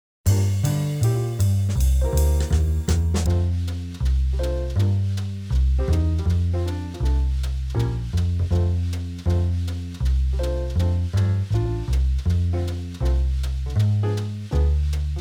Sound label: 160, Backing tracks; jazz musicians to practice songs that are in the Vanilla Book